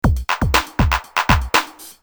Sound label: depressing rock music